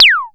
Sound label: ZAPPY; Back In Time Records; Big Bang